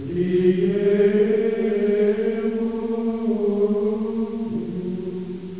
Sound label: chant; cathedral